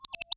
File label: Fish